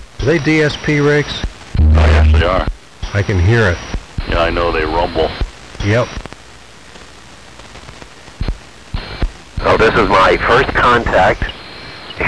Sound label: IC756 Rumble